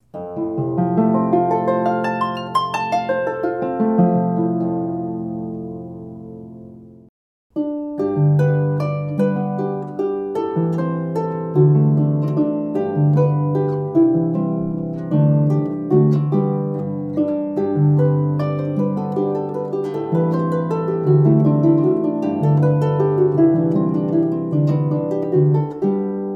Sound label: Harp F to F